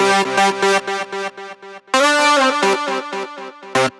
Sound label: Shark Lead, deep house